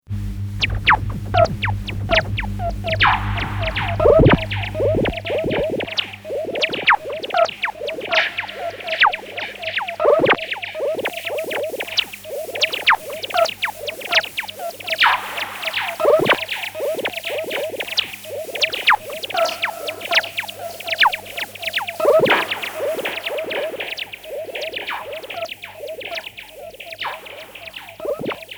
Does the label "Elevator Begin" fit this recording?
No